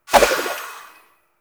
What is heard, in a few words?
Kunai Whoosh, Weapons; Warframe; Choir